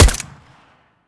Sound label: usp fire silencer; weapons; csgom; cstrike